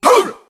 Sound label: huh male, Voice; ooh ahh; heh huh